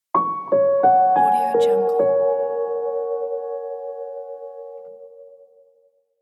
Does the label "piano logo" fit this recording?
Yes